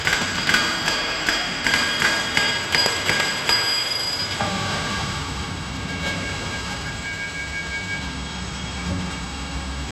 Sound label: drafting pencil sketching